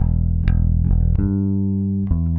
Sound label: Electric Bass; Basses